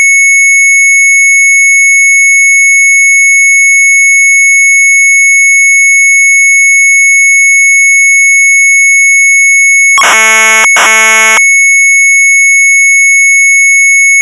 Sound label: MZ800 Tape, sharp; TAAL ITALIAANS1; retromelsarchive